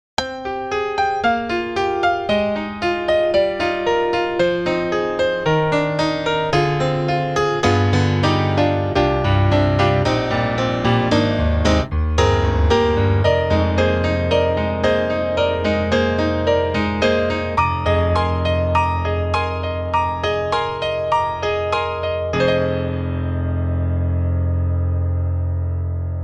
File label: Custom C7 Pop; Spectrasonics; Keyscape